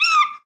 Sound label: jump tap; HeavenStudio; tap Trial